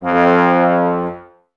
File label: BRS TBS F, Woodwind Brass Ensemble, Brass; Miroslav Vitous - Woodwind Brass Ensemble